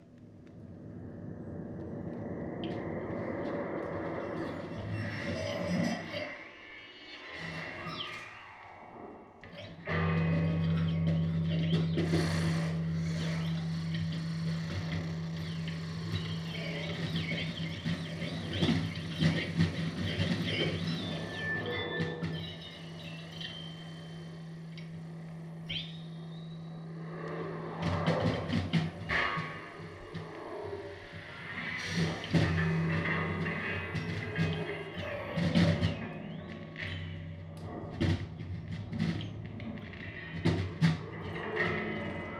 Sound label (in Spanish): impro libre y noise coro de mil chanchos